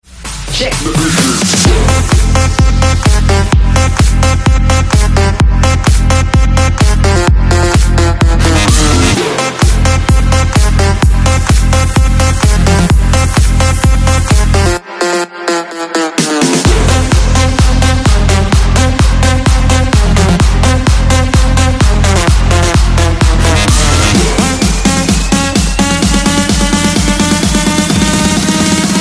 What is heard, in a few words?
trap ringtone; apple iphone